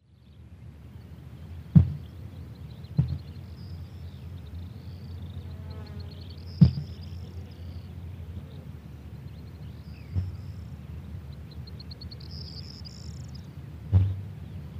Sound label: Great Bustard males display